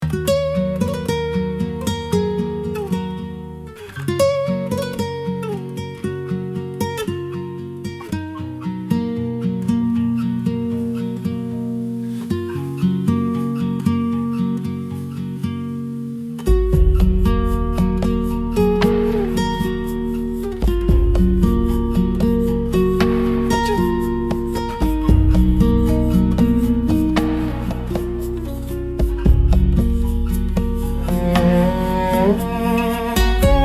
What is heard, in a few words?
acoustic